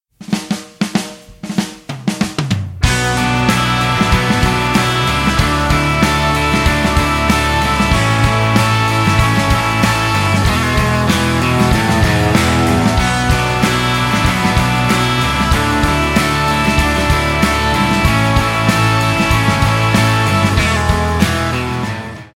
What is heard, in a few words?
rock song